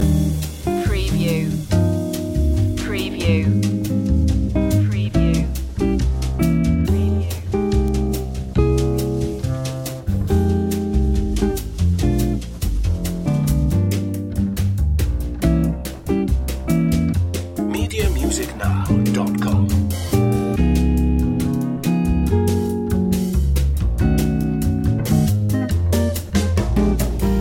bossanova loop

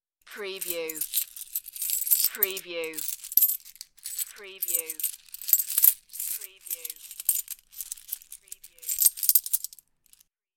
Coin Rattle

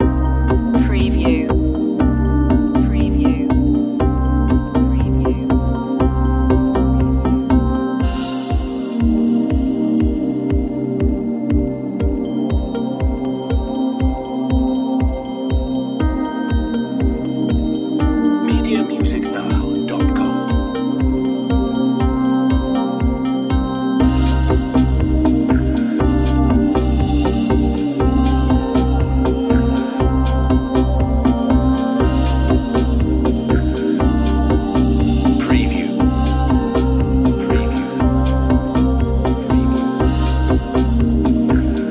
downtempo sunset

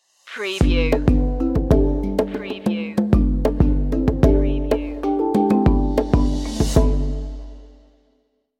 feel good island stinger

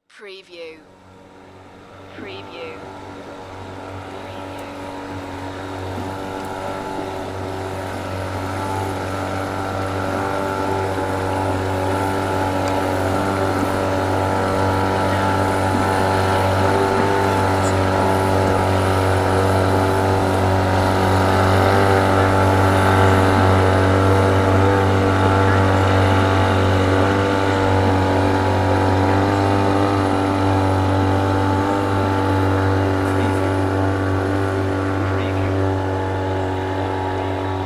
Boat Pass By Slow; sound-effects-bible

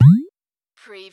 CARTOON BOING

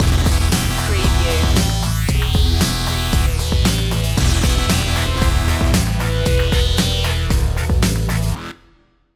last resort stinger

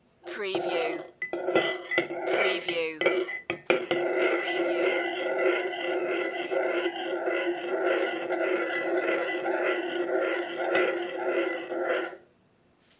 Bowl Stir Spoon

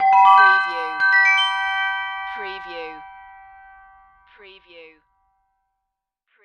Multimedia Chime

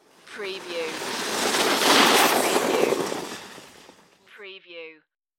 Ski By

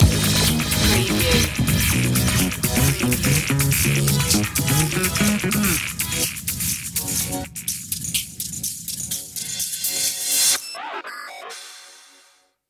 funky mario stinger

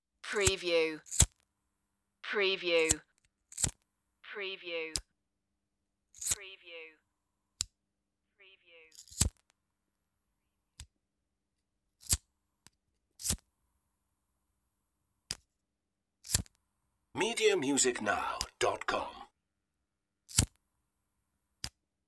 lighter

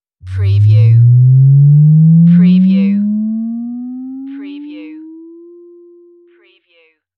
Bass Rise

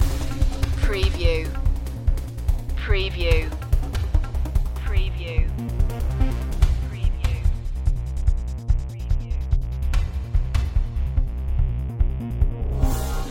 urban takedown loop

